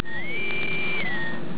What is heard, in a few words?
INTERCOM